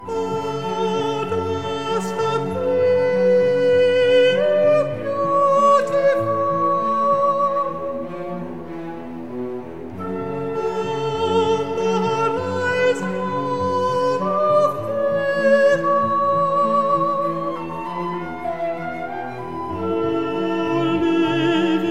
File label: Hymn